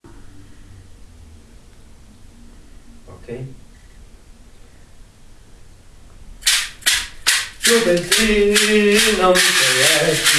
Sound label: Marcha